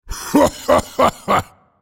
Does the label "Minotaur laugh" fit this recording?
Yes